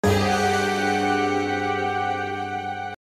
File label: dramatic chord; plotagon